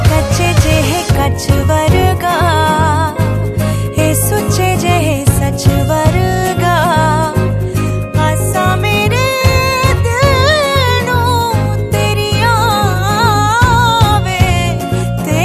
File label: Punjabi Song